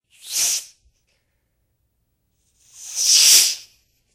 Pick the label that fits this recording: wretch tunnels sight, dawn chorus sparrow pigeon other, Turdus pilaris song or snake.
snake